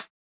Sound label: click; ogre-prototype; gui